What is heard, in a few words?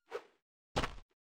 jump; crash bandicoot